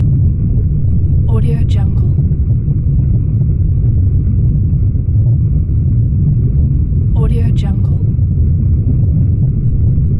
earthquake rumbles

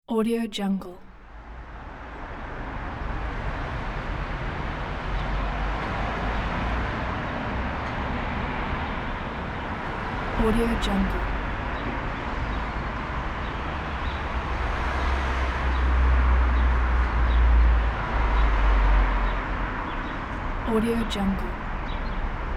distant city noise

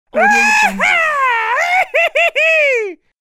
cowboy win scream

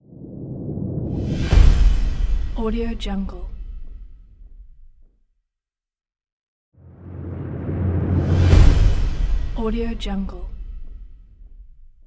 trailer drums hit

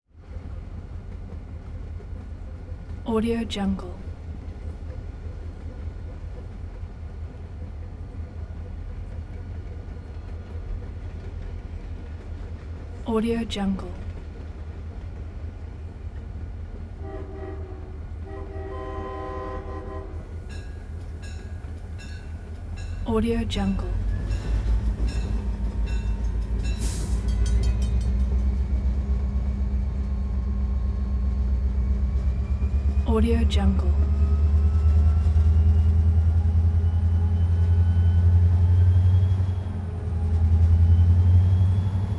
diesel locomotive constant emd gp35 interior

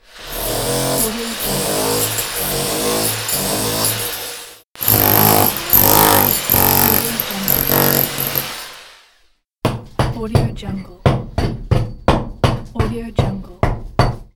repair

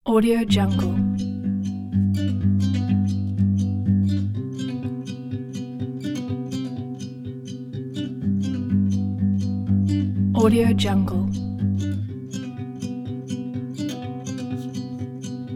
inspiring indie folk pack

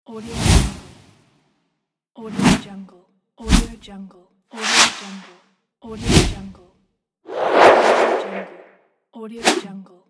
whoosh

